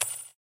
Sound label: money falls; Plants vs. Zombies